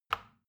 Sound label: plastic button tiny push regular